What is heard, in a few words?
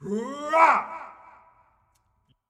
Effort Long; Marv